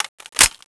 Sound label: awp clip in, weapons; cstrike